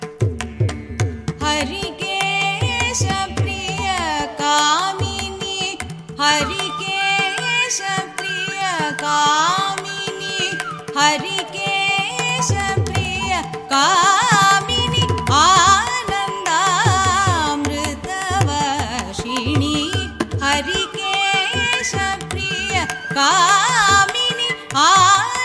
sudha mayee, carnatic music

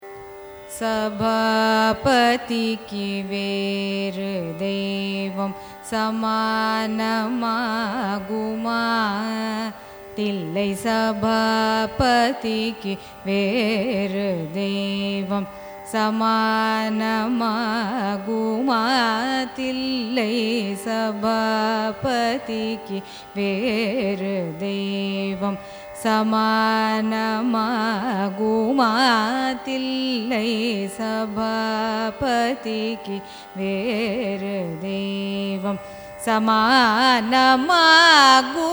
raga abhogi carnatic

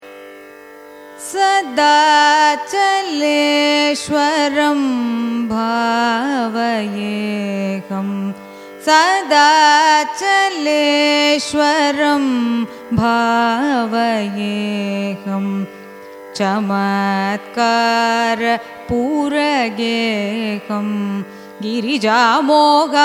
raga bhoopalam carnatic; sadachaleswaram

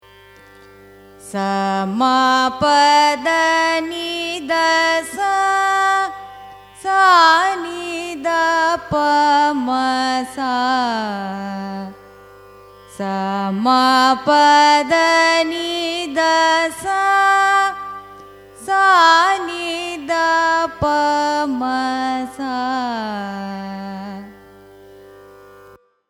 raga kuntalavarali arohanam avarohanam; carnatic music

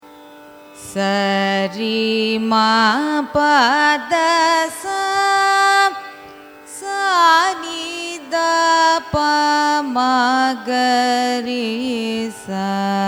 raga yadukula kambhoji arohanam avarohanam; carnatic music

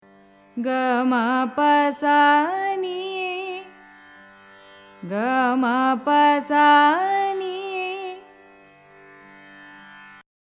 gambheera nattai; carnatic music

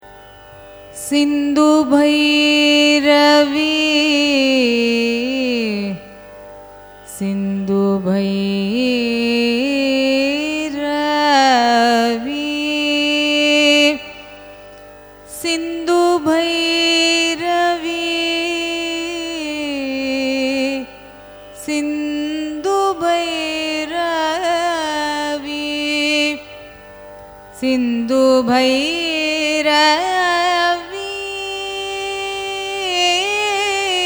raga sindhu bhairavi signature, carnatic music

